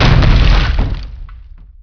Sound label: menuselect; Daikatana